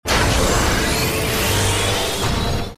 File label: spiral gauge up; Hacknet; Ambient